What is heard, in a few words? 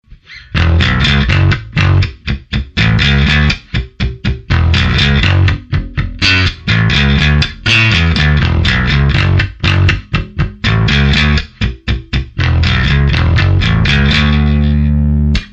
bass